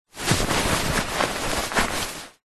foley clothing jumper sweater pullover movements handling